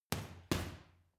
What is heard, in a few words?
boxing punching bag uppercut double medium